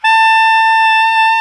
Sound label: SOP SAX A